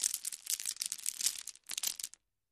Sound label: Landscape Rock On Con, rocks & dirt